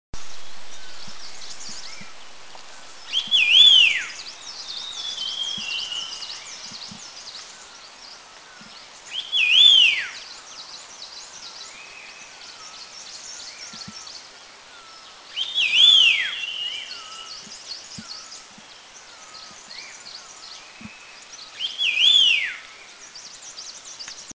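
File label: Scaly Laughingthrush; Eaglenest; India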